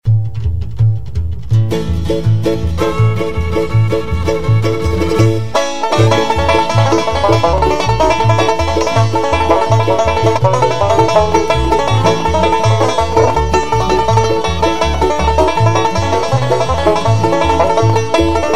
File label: Bluegrass; Bristol MT Bluegrass